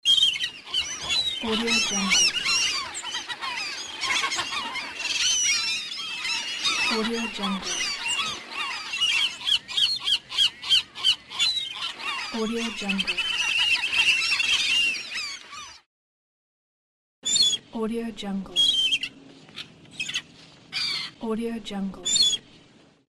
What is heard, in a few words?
seagulls